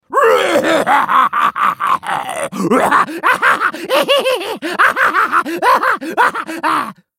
hyena laugh; Warwick

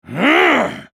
spell cast effort; Garen; Character Voices - English

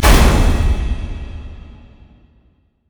feint shield; riven skin05

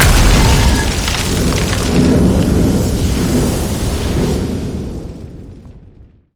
boom; nasus skin05

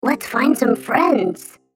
attack; BadRobotAmumu

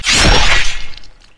yasuo base basic attack; LoL

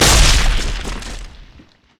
martial cadence attack; LoL; jarvaniv

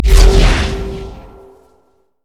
summonerspell base barrier; LoL

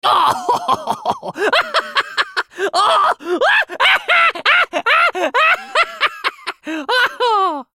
laugh; MonkeyKing; Character Voices - English

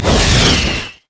carpet bomb; rumble skin03

